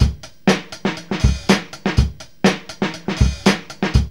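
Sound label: 124 bpm, Funky loops; Zero-G; Funky Elements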